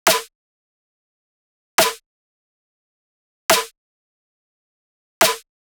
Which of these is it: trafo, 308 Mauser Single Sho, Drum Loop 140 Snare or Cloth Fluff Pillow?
Drum Loop 140 Snare